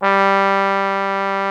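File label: trombone G2; MONO BRASS; Greytsounds VOL-1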